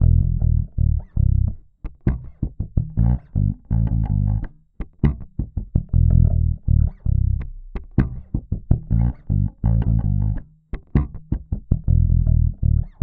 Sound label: Bass loop 81 E; Electric Bass Loops; Slate Digital BASSHOLE Sample Pack